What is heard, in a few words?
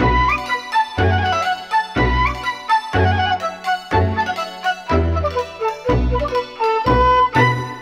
flute, Loops, Phonky